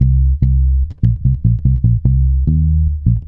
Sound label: ragga, bass